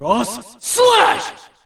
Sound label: Slash; Yu